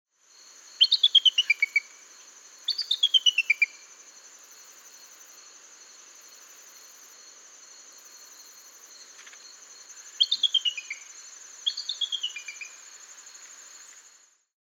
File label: Blue Jay Cyanocitta cristata